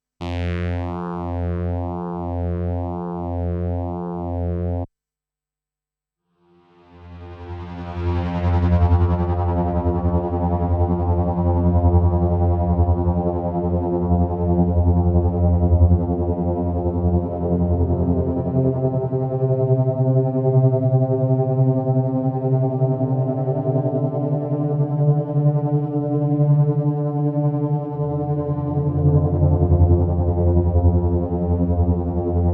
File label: synthesizer; eventide h90